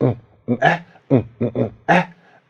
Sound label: presidential, beatbox; Drum loops